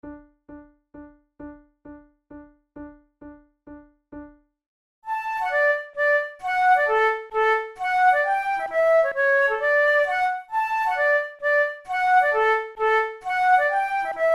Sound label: waltzes